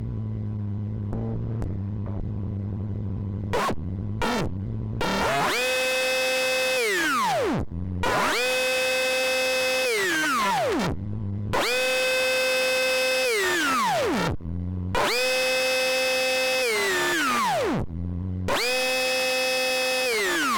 Guitar Pickup - Blade - Single Coil - Bridge - Boltdriver - Short Swells; Pole Position; The Guitaresque Power Tools Library